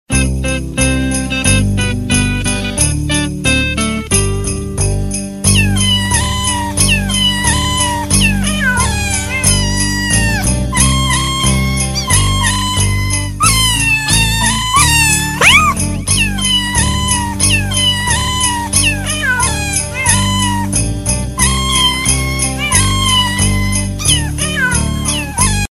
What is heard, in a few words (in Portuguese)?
miado de gato natal